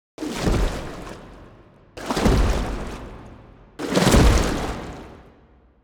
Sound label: giant monster tread water